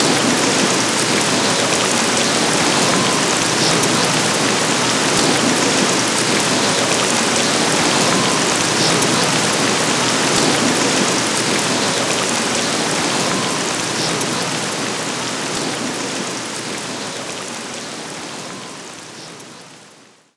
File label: Gloomy Manor Open Window Ambience